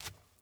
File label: dirt jump; teardown ps5 windows xbox series xs gamerip 2022